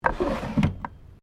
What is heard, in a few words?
Drawer Cabinet Wooden Small Close; Various domestic drawers; Household